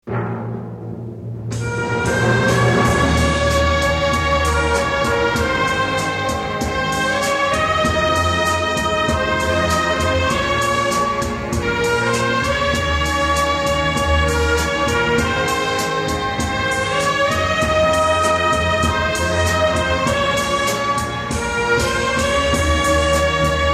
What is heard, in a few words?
instrumental